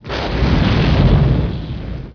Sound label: crash jungle